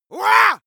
male shout fight

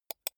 computer mouse wheel click soft

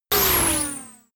sci-fi laser shot

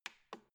embedded button press

